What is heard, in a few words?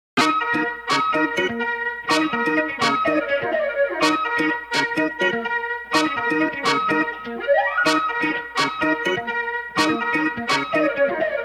Modern, EDM Pop